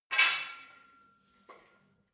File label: barbell weight rattle hard; sport freizeit